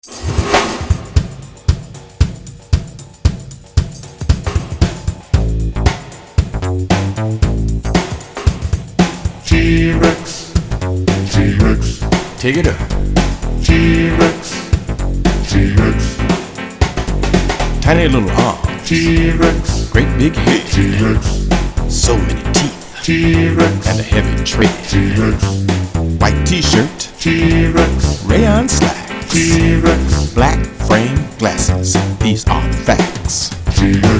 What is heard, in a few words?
Funk